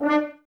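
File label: Brass French Horns D; Sample CDs; Miroslav Vitous; Woodwind Brass Ensemble; F.Horns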